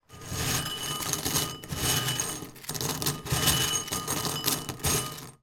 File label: food cereal malted wheats movement hand in bowl